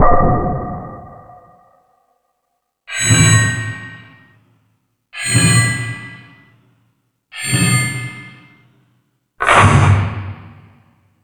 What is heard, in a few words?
Industry, Drum Machines E-MU Pro-Cussion